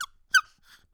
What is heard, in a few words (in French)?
LOOP Couinement Toutou Baudruche Mono